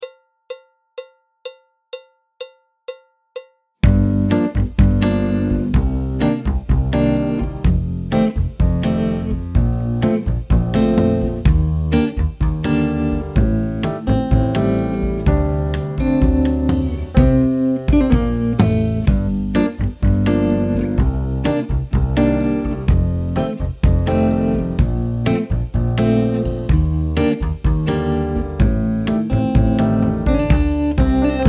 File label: clarinet, flute, tenor, trumpet; Jazz Syllabus Level 4